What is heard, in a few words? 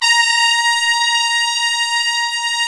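brass trumpets, FX; Roland L-CDX-03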